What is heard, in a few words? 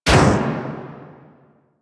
Bomber Explosion; minigame; weapons; player; super weapons; Warframe; Caliber Chicks